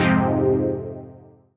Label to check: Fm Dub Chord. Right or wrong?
Right